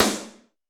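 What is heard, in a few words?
Snr Turbo Drve, snare; Alesis D4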